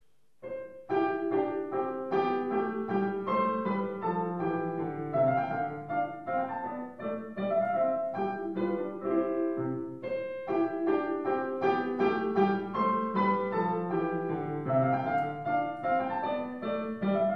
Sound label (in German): Konzertfluegel; mozart